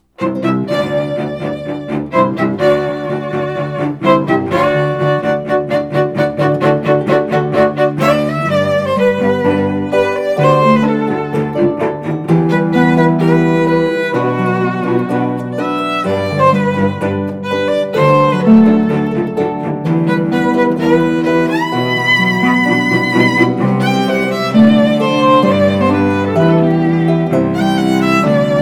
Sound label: harp trio